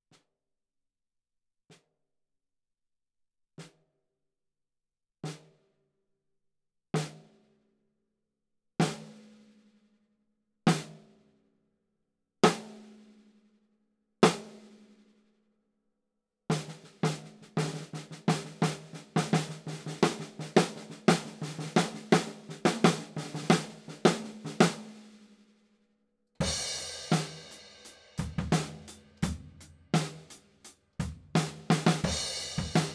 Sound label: Rode NT5 overhead right low; Low tuning